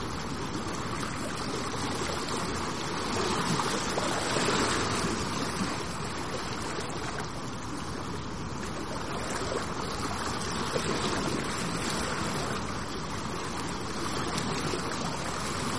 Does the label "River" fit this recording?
Yes